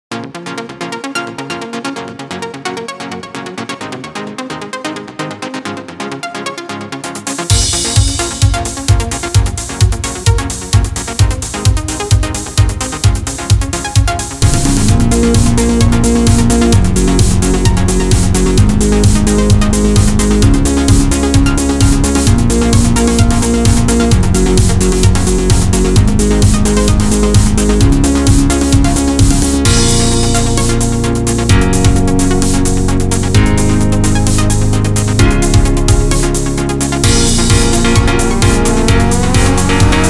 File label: spaceship, edm; ニューエイジ